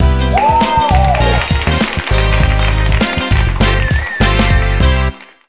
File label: Correct; Dinox; Wii U